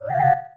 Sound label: teleport